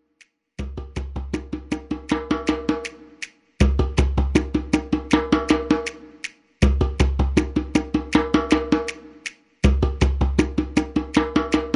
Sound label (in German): Warm up 4er fast; Uebungsraum 3 und 4